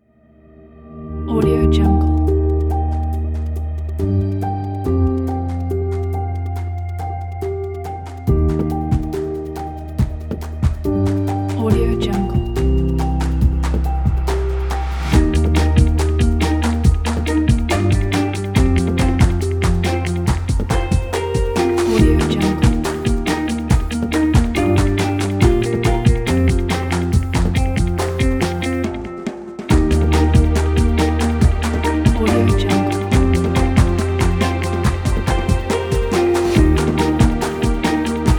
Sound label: uplifting background